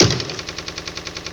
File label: arrow Miss; HeavenStudioPlus; sneaky Spirits